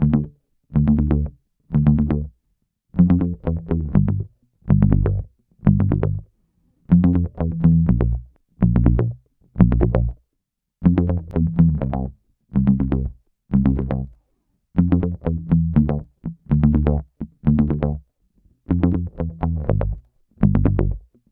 Bass loop Rapid 122 Dm, Electric Bass Loops Direct; Slate Digital BASSHOLE Sample Pack